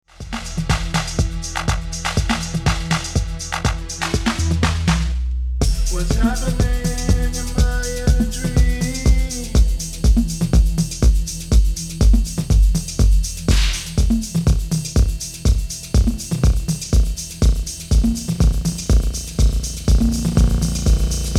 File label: Fast Time Feedback